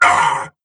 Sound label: pain; doomguy